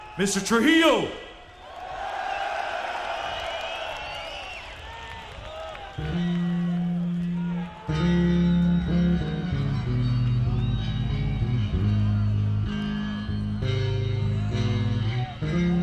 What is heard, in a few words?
Bass Doodle